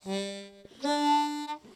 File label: verdigris7 harp; backwards